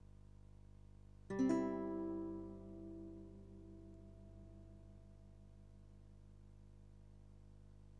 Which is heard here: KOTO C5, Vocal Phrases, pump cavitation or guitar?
guitar